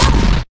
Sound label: metal hit; scummvm; The Griffon Legend